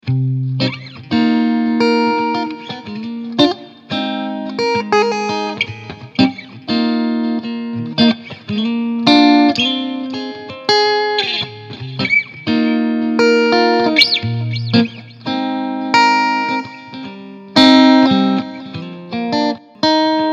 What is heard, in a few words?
clean; kong tube fifteen